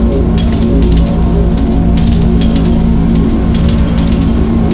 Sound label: electric bus engine loop; auto; Tony Hawk's Project 8; XBOX